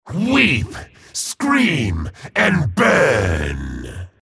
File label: deathknight weep scream and burn